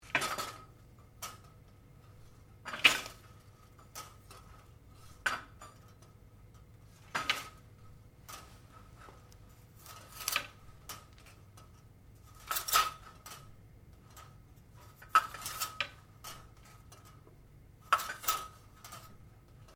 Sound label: Blinds Venetian Movement, Household blinds; Living Room